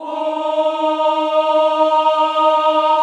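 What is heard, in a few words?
choir E flat 3, Ooh; Sound & Vision; Gigapack I CD 2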